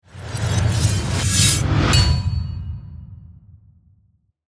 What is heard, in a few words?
Whoosh To Hit, Production, Element, Whoosh, To, Hit, Shards, Fly, Suction, To, Metallic, Hit; BlastWave